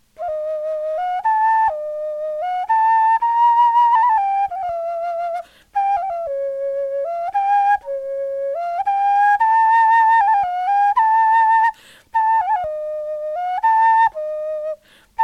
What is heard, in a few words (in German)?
melodie, knochenfloeten